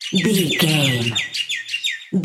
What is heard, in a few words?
bird small song call chirp